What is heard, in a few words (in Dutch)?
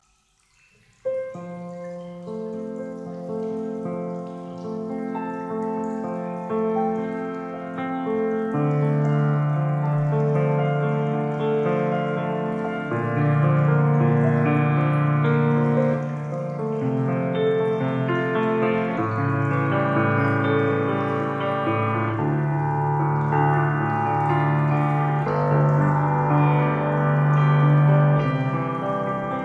piano improvisatie